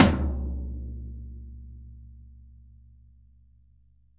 VINT KITS; Sonic Reality - Vintage Timetraveler

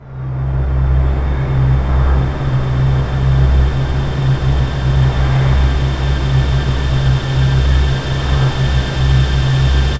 Pad; Dream Zone

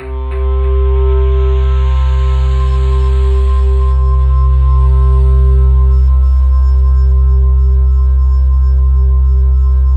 WHISPERS, ESOTERIC PADS; Zero-G Ambient 1

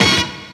DR. LOOP; X-Static Goldmine 3; CHORDS

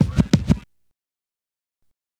SCR, Hip Hop and R&B; Best Service ProSamples vol.02; AKAI

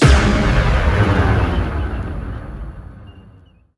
FX HIT, Techno Trance; Ueberschall

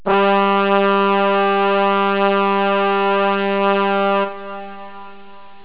horn; jazz jackrabbit 2

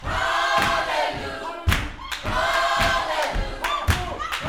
Vocal, Gospel, PHRASE; Spectrasonics

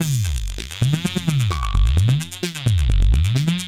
Cylon Beats (130BPM)

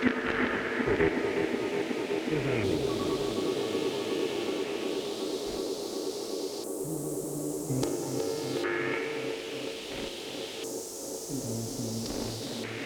Random Delayed radio Waves

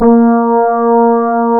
A#, Synclav FM, BRASS; Big Fish Audio

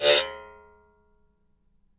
BIRIM COIN#, BRAZIL

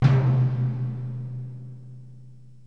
orch drum, Kurzweil K2000

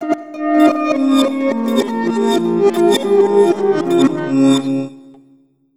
SSTRINGS, REVERSE FX; USB Soundscan vol.60; Asian Traditions; AKAI

